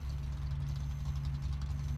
manager Idle; fairymirror

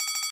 big Win Count Up Loop; jack and beanstalk

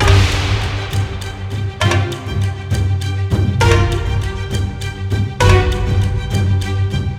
dazzle Wild music; fortunerangers

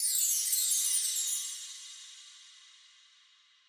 chimes; fairymirror

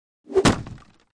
sym Anim Axe; jack and beanstalk

